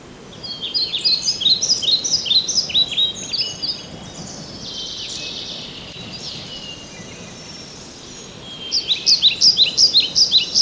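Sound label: forest ambience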